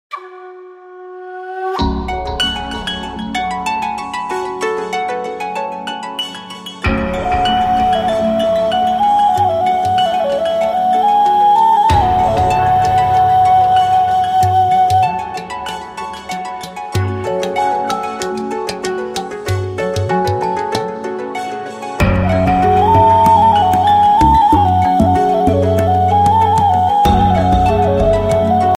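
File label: vivo ocarina ringtone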